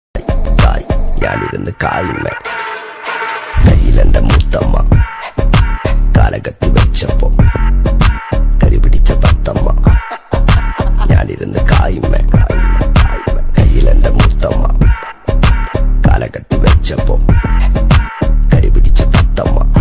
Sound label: malayalam rap song trending bgm